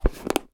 household plastic container case small click close